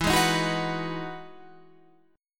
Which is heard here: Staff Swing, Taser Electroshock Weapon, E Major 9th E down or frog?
E Major 9th E down